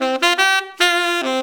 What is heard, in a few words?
SAX C; VST